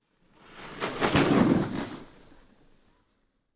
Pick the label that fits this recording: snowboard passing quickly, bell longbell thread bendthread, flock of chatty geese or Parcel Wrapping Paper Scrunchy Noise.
snowboard passing quickly